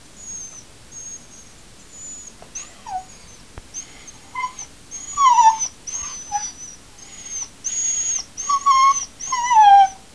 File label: Canis familiaris squeak